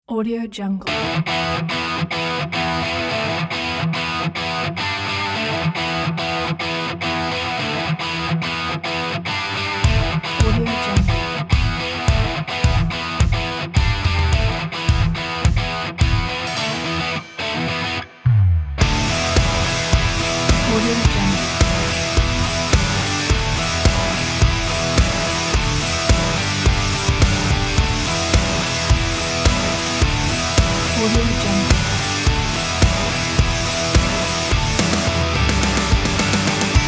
rock school

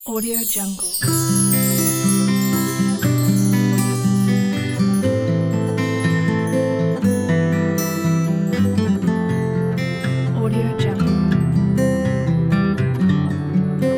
emotional romantic inspiring pack

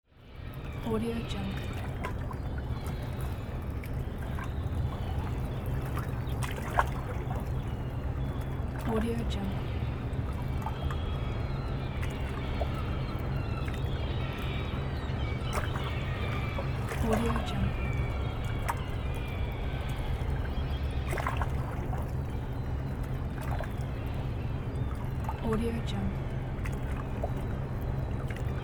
boat dock ambience

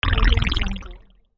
futuristic creature gargling sound

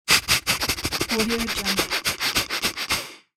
dog sniffing; applehillstudios

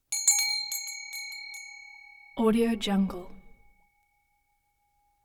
brass bell various rings long decays

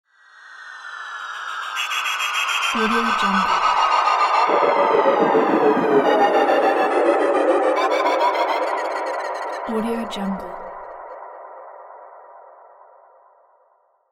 space teleportation transition; soundmasterfx

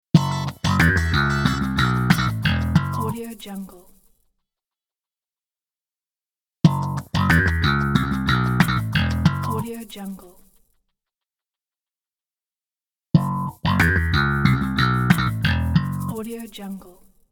short funky logo; dumaux ser

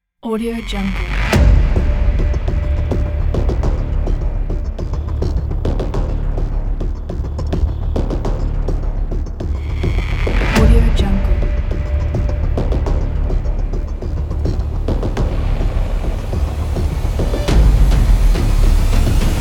action hybrid trailer

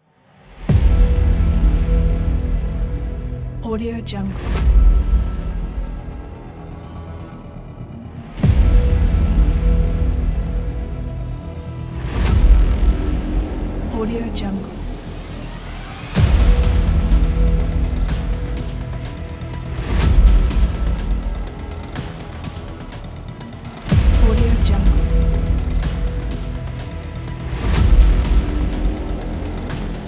dark intro

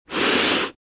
female sniff; sound-ideas

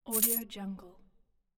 sell item

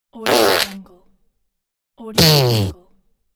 farting

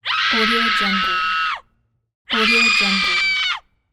scream

